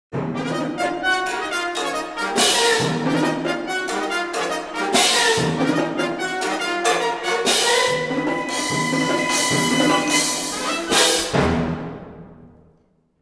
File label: Samba